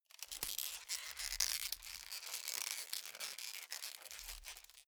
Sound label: calamus creak short quick